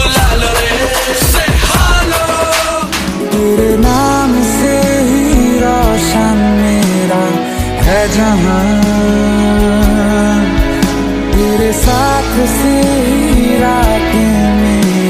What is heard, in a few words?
bengali ringtone